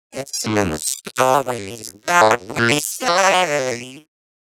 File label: Vox, Circuitbend; 99Sounds; Mangling Audio